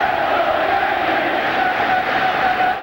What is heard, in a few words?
Baseball Fanfare; Crowds